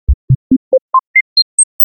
Sine up

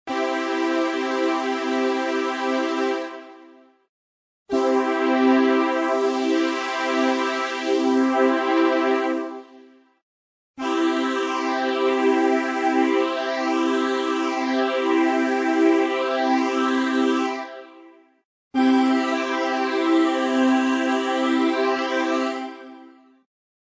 Phasing effect

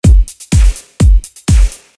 Loop sample

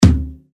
Kick (acoustic)

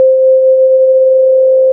Panning

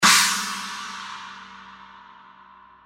Crash china

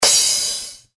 Crash usual

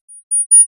Sine vol up

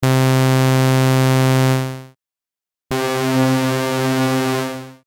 Chorus effect

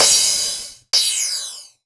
Flanger example (crash)

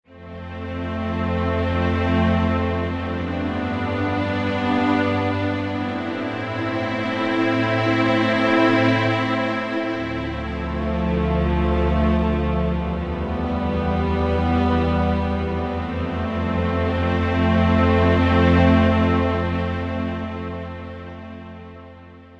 Fruity Soundfont Player and String Marcato

